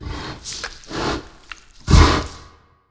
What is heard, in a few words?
idle, dragon